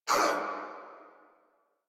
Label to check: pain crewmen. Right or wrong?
Right